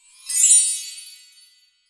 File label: fairy soul; catha